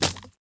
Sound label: Arrow Hit